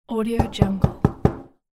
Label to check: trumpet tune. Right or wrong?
Wrong